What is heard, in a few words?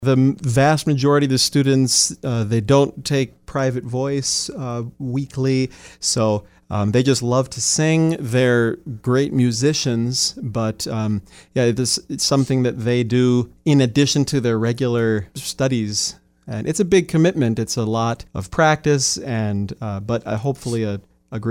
Choir; university of jamestown christmas choir concert